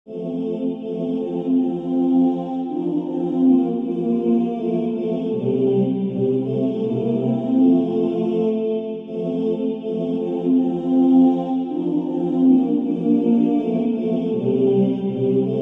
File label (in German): erlaube mir tenor